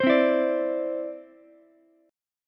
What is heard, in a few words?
C Major Add 9th, Ukulele; strummed; JGuitar